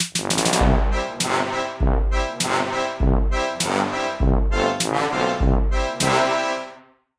Dance; Fortnite Save the World

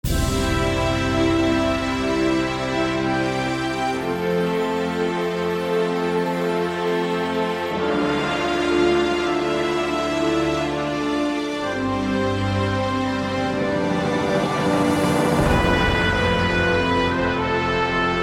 American Victory; Blitzkrieg 2

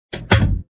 Door Close; Super Mario 63

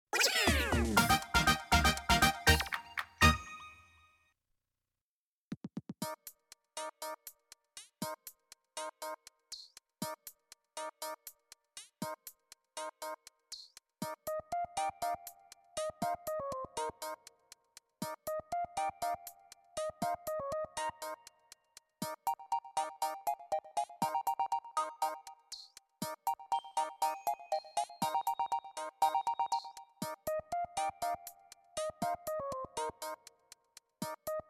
Bingo Battle Win!; Pikmin 3

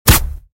Cast Arrow

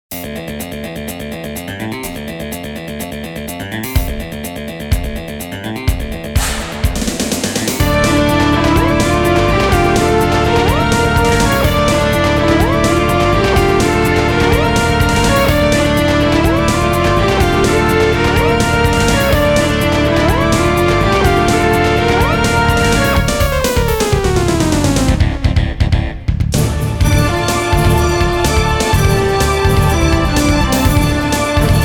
Intense Fighting; Shin Sangokumusou; kyukyoku ongen; Virtue

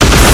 GATLING attack; guns n zombies 2014 pc

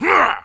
Monster Human Attack; Inotia 3

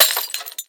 Sliced Hotsauce; Boomerang Fu

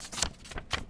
Pickup Paper Note; Lucius III

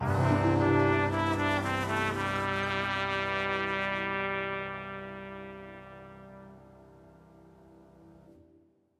Sting; lego the incredibles 2018 pc; Mx Hub New Area Reveal

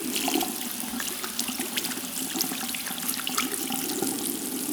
Panel Dirty Rinse; Among Us

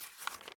Pick up card photo; The 39 Steps

